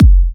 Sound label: Kicks; Vengeance Samples